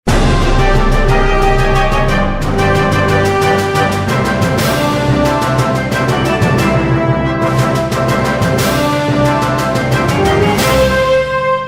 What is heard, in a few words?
clan castle music; clash of clans